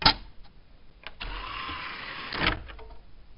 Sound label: CD Eject